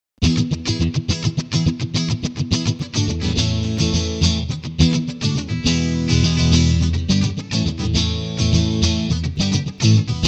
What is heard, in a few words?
Clean Twin